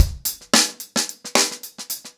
Hip Hop 110BPM, acoustic drums drumloops; The Roots Drumloops; Hail Hail Hip Hop

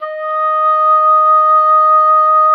D#5, OBOE; Xsample VOL-6

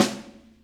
SNARE med, Drum Kits; Big Fish Audio - DOM; Redrum

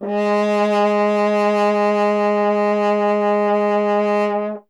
F. Horn; Miroslav Vitous; Solo Instruments VOL-2

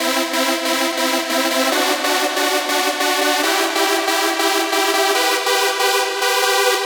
140BPM E; Vengeance - Essential Clubsounds Vol.4

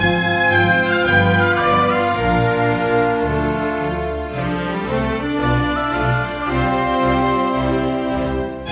Orchestra, Rock Pop

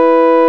FARF COMBO, Electric Organs; E-MU Formula 4000 Series Vol. 3 – Analog Odyssey

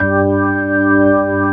ORG, Organs; Sound & Vision; Gigapack I CD 2; ORG B-3 Organs

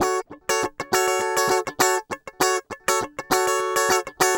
GUI 100 BPM

